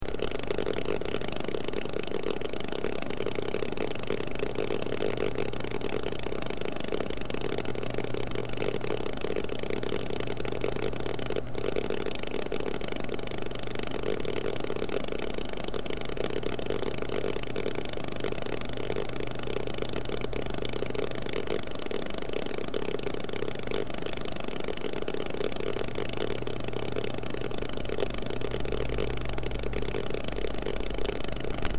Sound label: noise